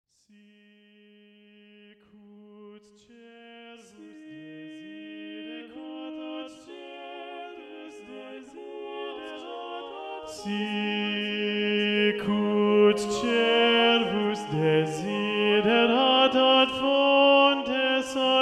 bass predominant; sicut cervus; palestrina

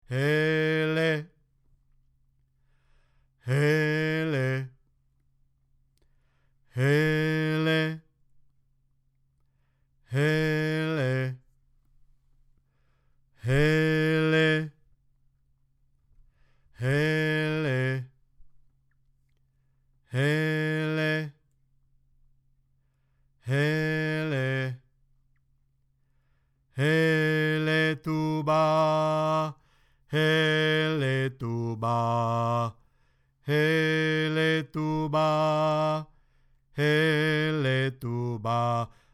tuba bass

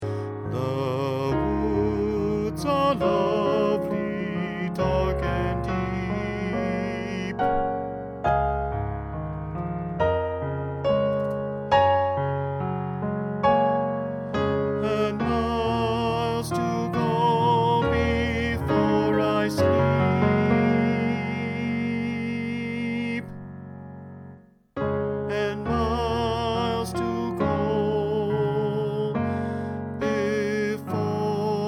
stopping tenor

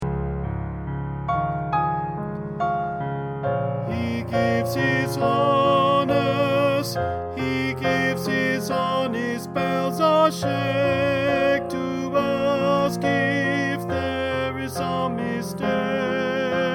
stopping tenor

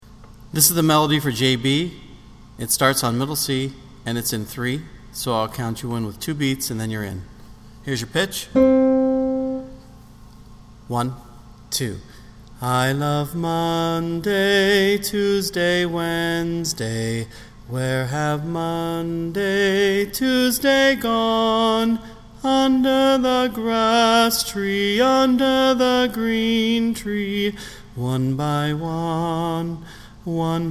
melody only

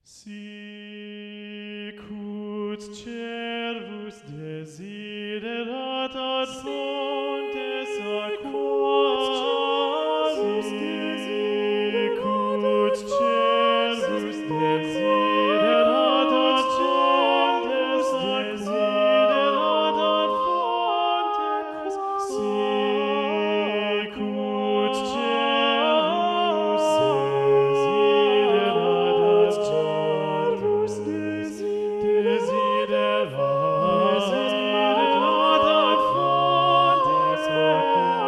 alto muted; sicut cervus; palestrina

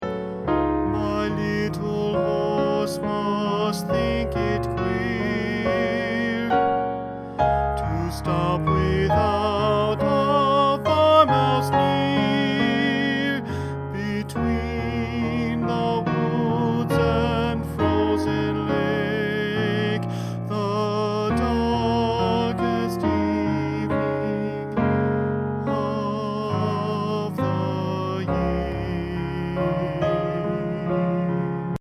stopping tenor

